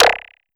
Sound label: JUMP UP LASER BASS E; KULTURE; BELGIUM